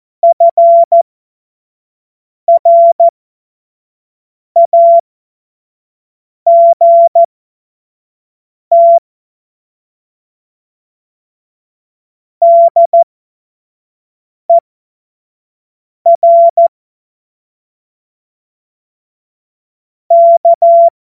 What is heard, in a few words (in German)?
Witz, Morsekurs, 25 F70; Witze